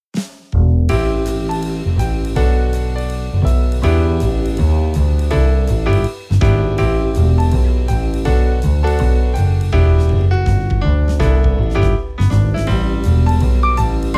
ジャズ

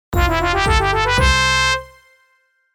ファンファーレ; ゲーム向け

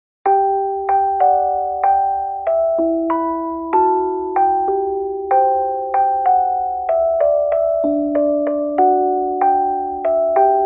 リセット オルゴール

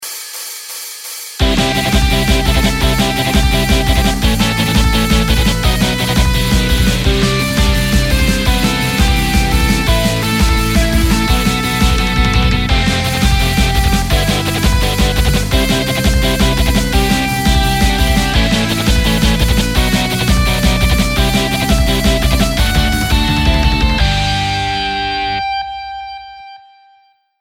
inst

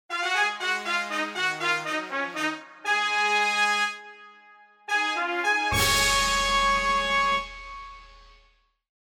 ファンファーレ

